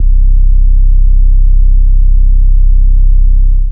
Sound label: Trap bass, EDM; 7rystan's 600+ FREE EDM SAMPLES & DRUMS